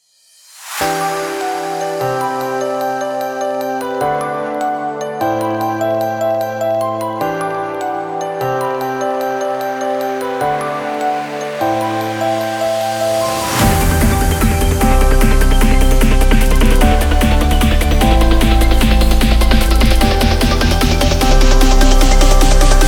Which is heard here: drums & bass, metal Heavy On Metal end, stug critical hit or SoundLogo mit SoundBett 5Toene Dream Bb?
drums & bass